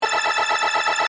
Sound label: Computer Readout, Scifi, Computer, Readout, Data, System, Musical, Science, Fiction; Blast Wave